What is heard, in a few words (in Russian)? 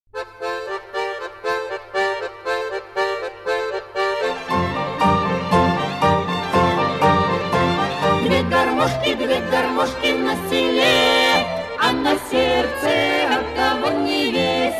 Dve Garmoshki